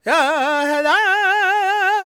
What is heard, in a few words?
NA G# MIN, Vocal Planet World Voices LAKOTA; ILIO; Vocal Planet VOL-5